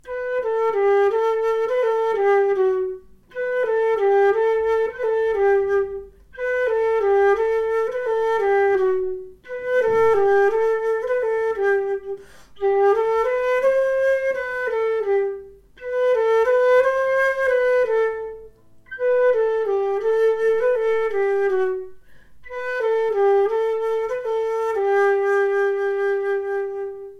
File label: Flute; Gwerz Ar Garnel